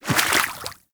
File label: Small Walk Water, Footsteps; Enemies; Warframe